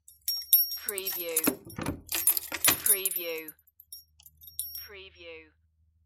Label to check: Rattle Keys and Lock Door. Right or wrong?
Right